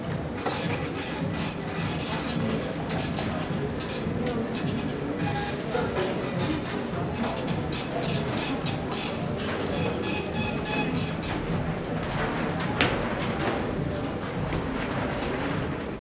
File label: market cash desk; best service Blue Box